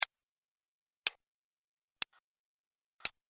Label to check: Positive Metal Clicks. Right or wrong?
Right